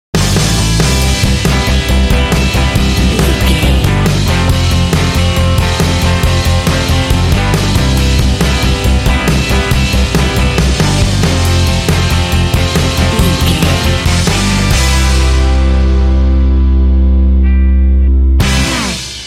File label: upbeat indie pop rock sting